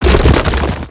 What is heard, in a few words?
rock pull; creatures organic